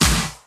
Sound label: acoustic snare sample a key